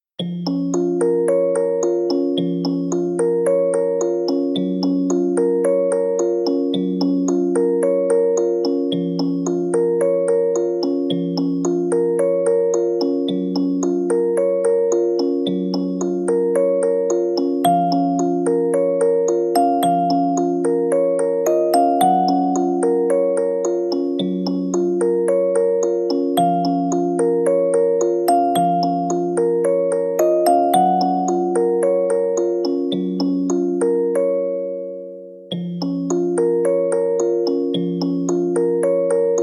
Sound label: Music Box